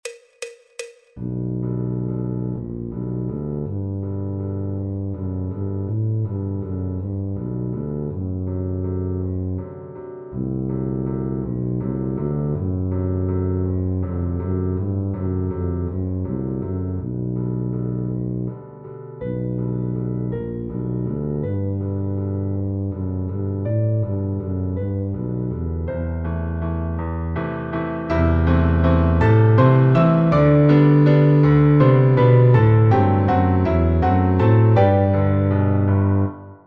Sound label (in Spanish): Tuba y Contrabajo Sol Mayor; Sobre las Olas; Over the Waves; Tonalidad Original